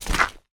foley footstep on ground boot dirt

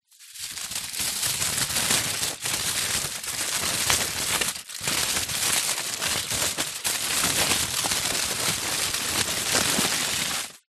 household tin foil sheet movement

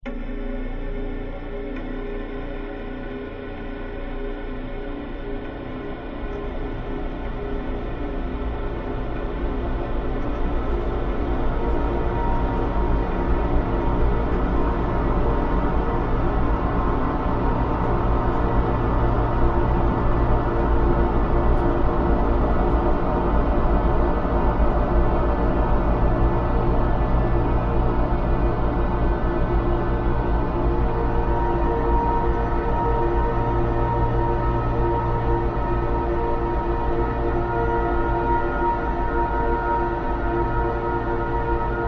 horror atmosphere dark ghostly long slow decay; zapsplat

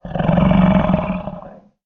horror monster growl; sound effects one

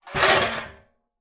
pans metal many drop on floor

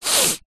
human male sniff snotty

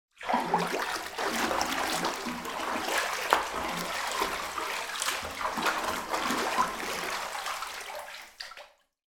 household bath water movement deep; sound effects one

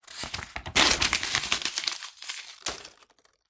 Book Paper Rip Scrunch Pile

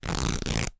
foley cloth denim rip

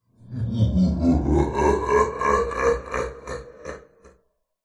horror demon devil evil laugh processed; zapsplat

